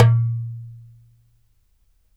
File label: DARABKA, Mediteranean Traditions, PERCS